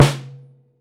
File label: Prepared Drums; Sonic Foundry (Sony Creative Software); The Electronic Music Manuscript Collection; Battery 3 Sample Kits